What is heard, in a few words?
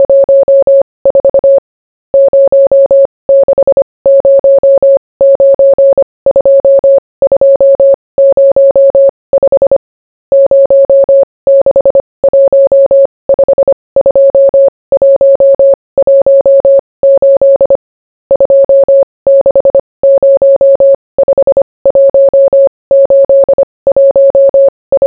morse; zodiak 298 lew leo